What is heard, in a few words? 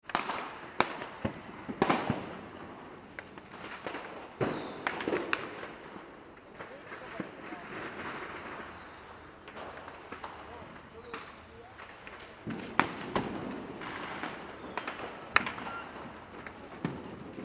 fireworks street urban; McKinney Sound